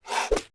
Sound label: sfx currency scorpion; summer of our discontent; the summer of our discontent act 2 turbo tappin